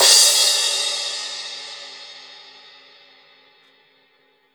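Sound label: crash, Drums Acoustic; AKAI; 90s sample CD; USB Soundscan vol.10; RAW KIT 9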